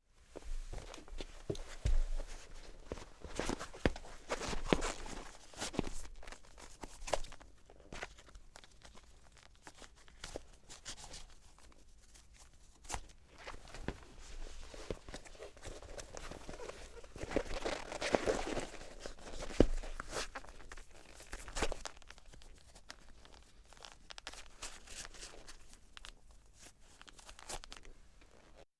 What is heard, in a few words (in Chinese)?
系鞋带